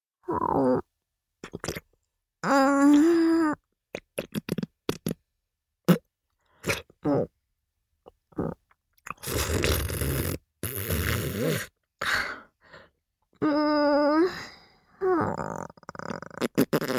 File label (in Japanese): フェラチオ(中)：頭を前後にゆっくり往復して陰茎をしゃぶる; 実用ボイス抜粋集